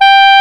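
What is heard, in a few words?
saxophone G4; AKAI S-Series CD-ROM Sound Library VOL-8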